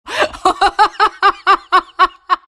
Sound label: luna laugh